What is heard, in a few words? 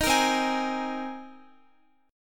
C Minor 7th Sharp 5th, Guitar; strummed; Cm7#5; x,x,13,15,12,15; 4E,4A,5D,5G,5C,6F